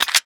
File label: p250 clip in; gmod; csgo; weapons